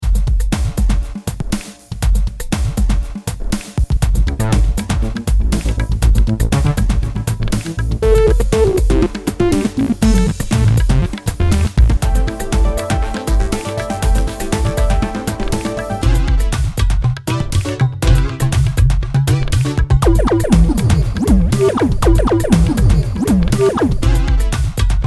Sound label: Music loop